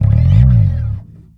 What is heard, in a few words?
Bass Slide FX Bright, Electric Bass One Shots; Slate Digital BASSHOLE Sample Pack